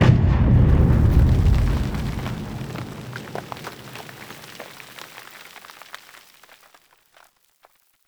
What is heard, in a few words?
Battle Ambient Tunnel Rumble; Warframe; Tau